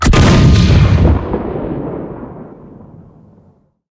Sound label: cannon 128mm pak44 shot, cannons tanks; War Thunder